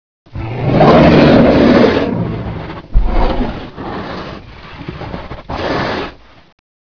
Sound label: escape; leviathan